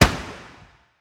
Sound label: Fireworks Small Explode; angry birds rio